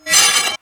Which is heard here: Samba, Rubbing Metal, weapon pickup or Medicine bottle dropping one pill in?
Rubbing Metal